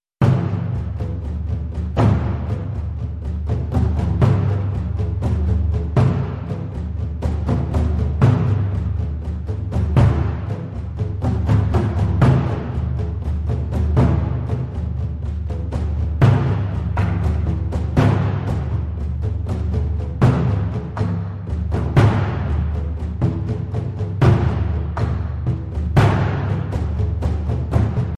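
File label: background music Telugu Ringtone; AA20 Pushpa First Look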